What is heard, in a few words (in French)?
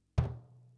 Surdo digitale main gauche plaque